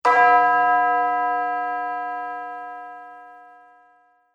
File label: churchbell